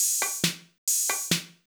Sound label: Beat, Old Skool House, 137bpm; Belfast